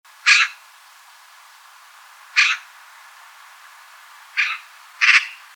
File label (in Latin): Pica pica; SETE